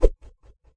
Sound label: kung fu staff, swings; UNUSUAL KITS and FX